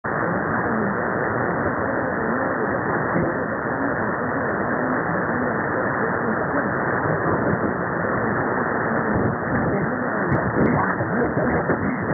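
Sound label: RNE1 Spain, radio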